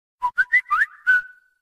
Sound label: Notifications